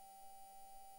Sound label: mic, mars2020 edlcam